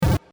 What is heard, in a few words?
wall